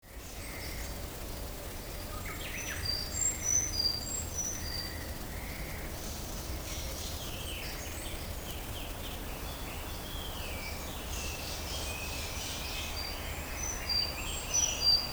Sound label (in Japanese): ezomusikui